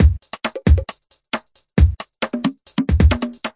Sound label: silly fastbreak, drum loops and breaks